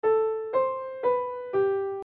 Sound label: Melodic Dictation Introduction 4 Notes